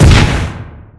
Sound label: flak explode; Weapons; EK modern; Barotrauma